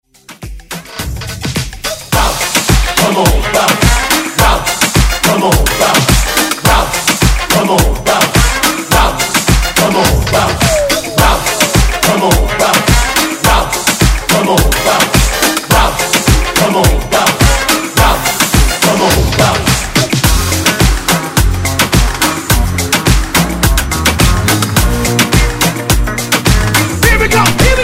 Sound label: Moombahton Hype Rework